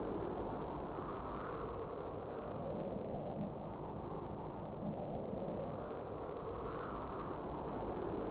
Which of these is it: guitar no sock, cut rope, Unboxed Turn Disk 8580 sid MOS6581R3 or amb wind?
amb wind